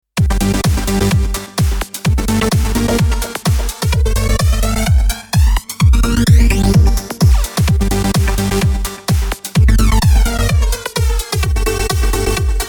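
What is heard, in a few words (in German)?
Hörprobe djm 350 JET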